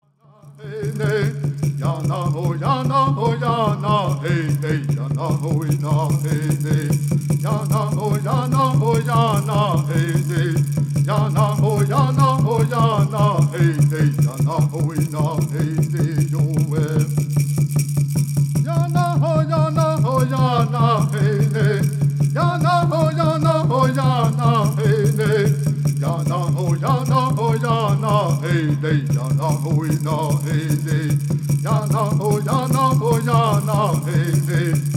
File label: Native American Church Song